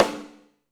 STICK ROOM, SNARES; Back In Time Records - Big Bang

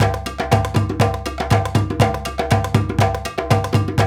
120 GUIN, Guinea; Spectrasonics; Supreme Beats; African Contemporary

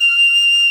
F5 PICCOLO; Northstar; New Gold VOL-2

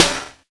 808 Snappy Hybrid Snare; Drums; Snares

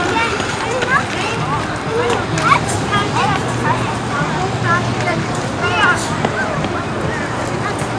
crowd out, background; AKAI S6000 CD-ROM - Volume 6

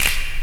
SNAP; Best Service; Dance Mega Drums; SNAPS

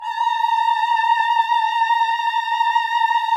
VIB F A#5, Symphony of Voices, VIBRATO; Spectrasonics

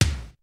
kicks snare, kid; Kid Nepro; Elite; KICKS SNARES

